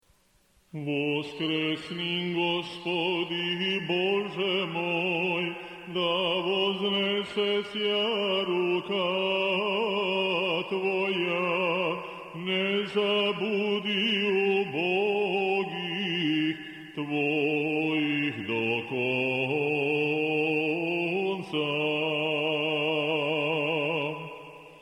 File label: Osmoglasnik VII glas